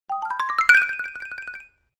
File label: Xylophone Accent Shr